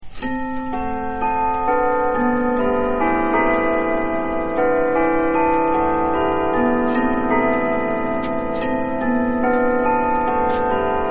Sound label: Wittington hour, grandfather clock; Grandfather Clock Co.